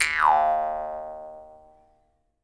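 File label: Jaw Harps, Pitched Percussion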